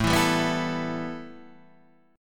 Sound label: A 7th Suspended 2nd; x,12,14,12,11,11,11,x